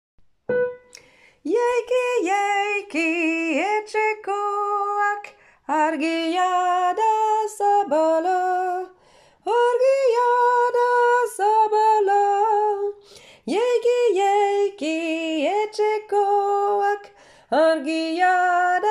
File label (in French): chant altos, choeur